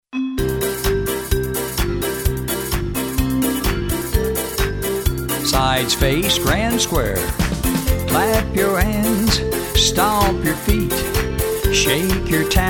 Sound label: vocal